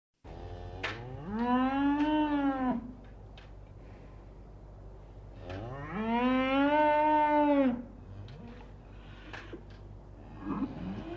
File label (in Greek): Agelades